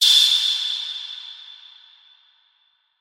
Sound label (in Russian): effekt zvukovogo impulsa